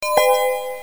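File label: news reminder; MediaTek; SNS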